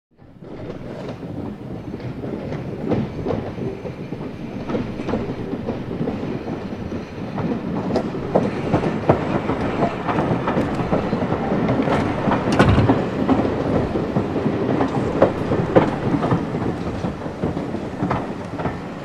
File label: In Stop Steam Engine